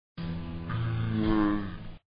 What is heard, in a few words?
yay